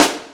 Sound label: Yamaha CS6, Drums